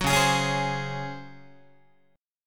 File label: C sharp Major 7th Suspended 4th C sharp Guitar; C#M7sus4 strummed; JGuitar